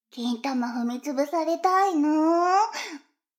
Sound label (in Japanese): シコシコ音声; 逆時間停止されて